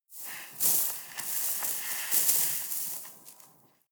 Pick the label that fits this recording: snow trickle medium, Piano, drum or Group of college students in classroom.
snow trickle medium